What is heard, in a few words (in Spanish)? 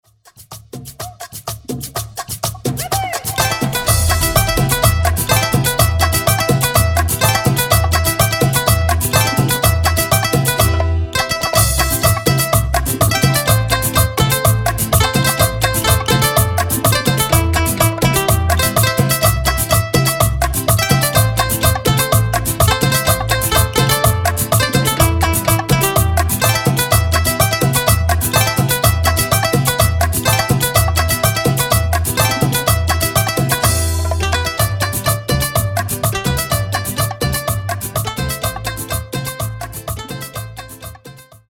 Instrumental Intro Bomba Urbana, Bpm 125